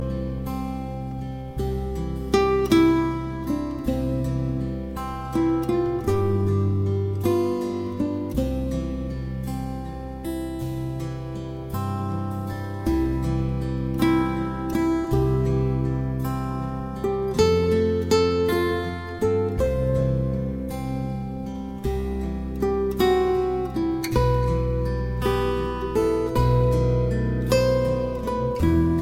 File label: guitar